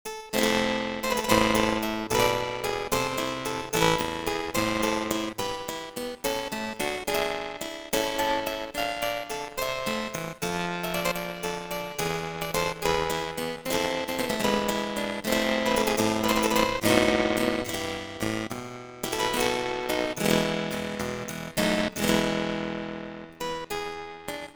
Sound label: harp distortion low; antiquing